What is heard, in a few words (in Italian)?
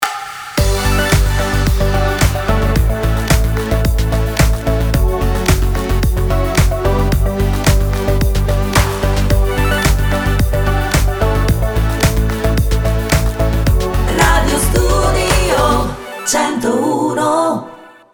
jingle; Radio Studio 101; Sikreative; Sardegna